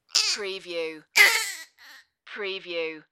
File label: Baby Whine